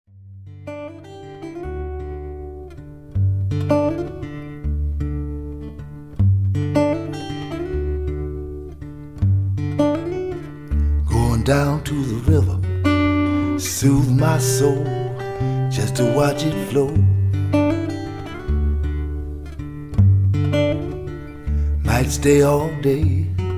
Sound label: River Blues